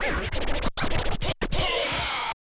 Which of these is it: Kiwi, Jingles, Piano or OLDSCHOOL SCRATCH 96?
OLDSCHOOL SCRATCH 96